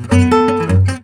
missed beat; weapons; dota; largo

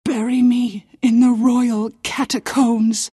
pain death; queenofpain

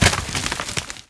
treant move; footsteps; furion

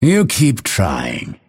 deny pain; dota; phantom assassin

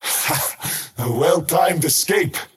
crown teleport pain; skywrath mage

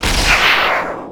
attack; templar assassin

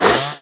pre attack; dota; shredder

